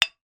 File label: vodka bottle hit against glass; food drink